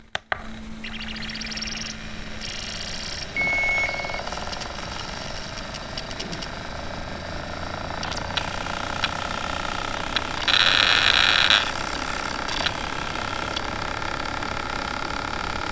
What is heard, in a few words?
metal machine noise